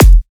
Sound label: drum kick tickle; Virtual Riot; Drum Hits